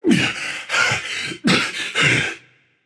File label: breath; bakasura